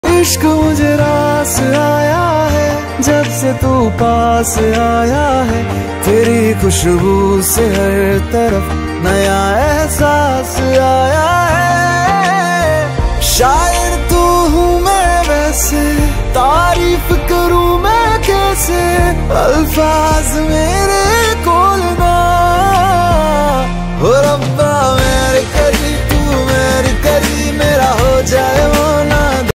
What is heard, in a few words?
hindi ringtone